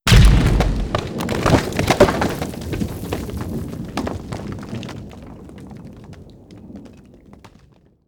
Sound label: Rock Explode Dry; moul-assets